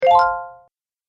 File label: Happy Game Bonus; Video Game Sound Effects